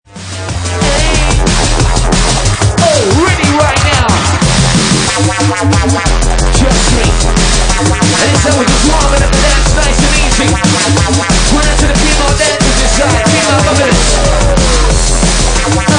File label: DNB